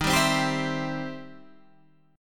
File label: E Minor Major 7th Sharp 5th, D sharp